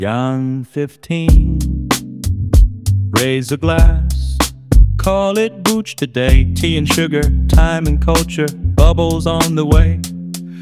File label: Groove